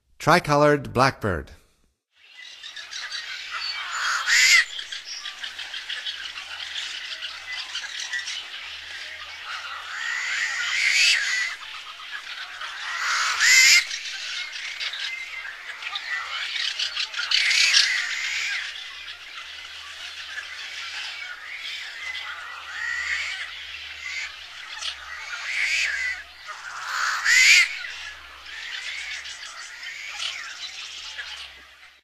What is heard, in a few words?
Tricolored Blackbird, Songs of Birds of North America; Stokes